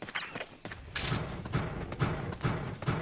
change to barrel sneak; mgs4

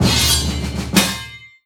get call to arms; europa universalis iv

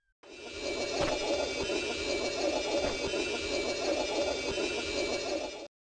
level end; crash bandicoot

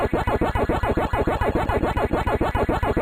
ghost retreat; Pac-Man

